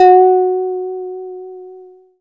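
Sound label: synth Harplike; Best Service Gigapack 1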